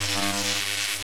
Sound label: ARC SEQ, ELECT NRG; Total Control - Action Cutz